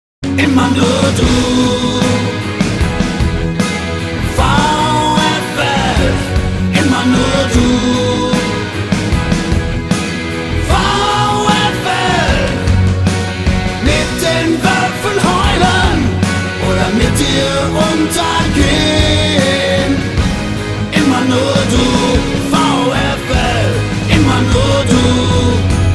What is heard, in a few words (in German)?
Hymne; Vfl Wolfsburg